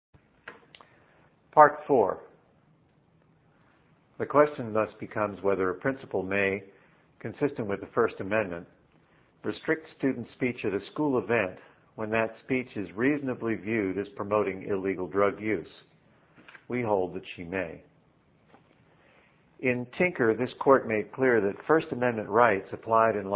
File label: Morse